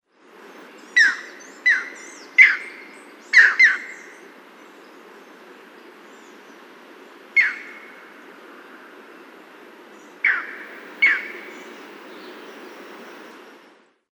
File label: green heron; paul marvin